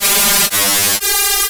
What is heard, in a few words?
noise